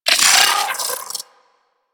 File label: Arachnoid Micro Vocal Pain; Warframe; Corpus